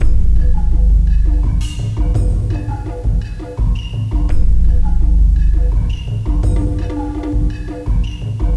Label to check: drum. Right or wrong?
Right